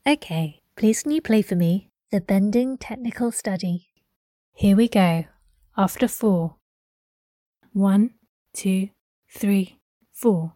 Bending technical study

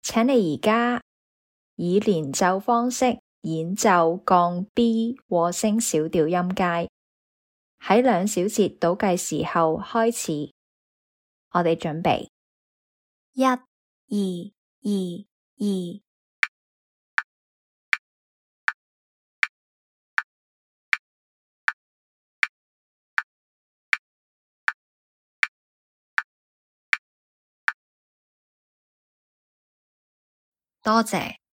b flat min harmonic scale legato

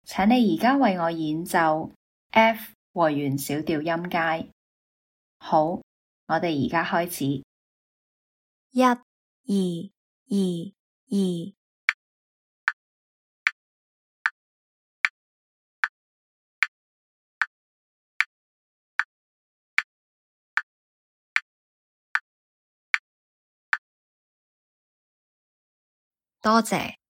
f min harmonic scale

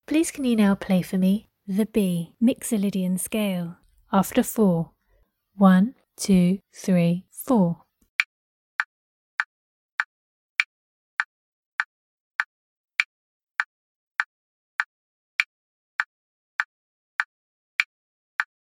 B myxolydian scale

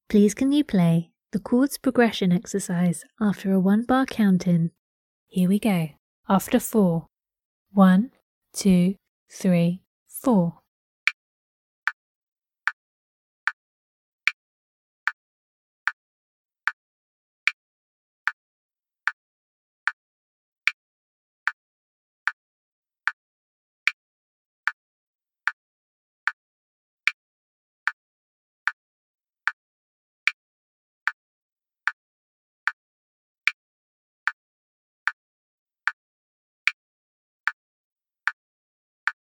chords progression

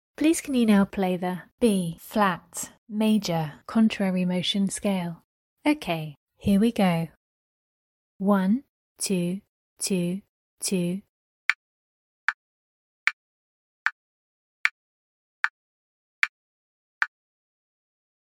b flat maj cont mot scale

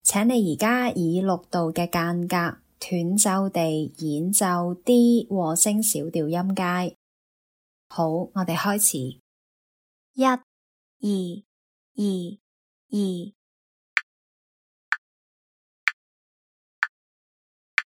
d min harmonic scale 6th apart stacatto

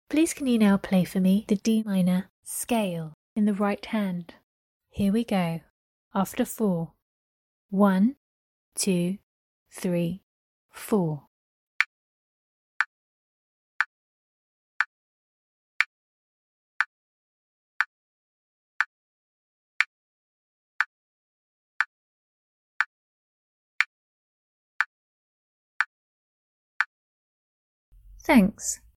d minor scale rh

